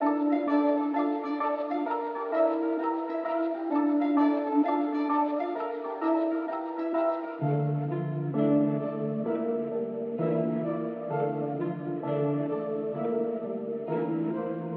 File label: dark athmosphere